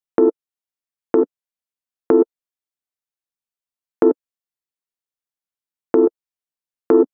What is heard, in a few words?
125 Rhodes Dom, Keys, Nu Jazz House; PowerFX - Nu Jazz House